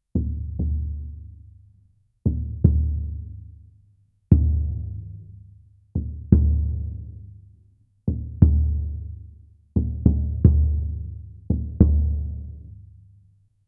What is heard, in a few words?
deep drums slow distant